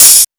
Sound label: HH OP; HH OPEN; AKAI; USB Soundscan vol.20 - Fresh Disco House I